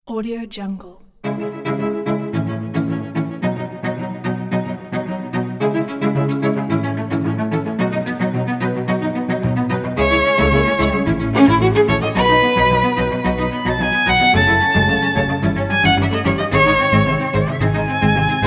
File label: modern energy cellos violins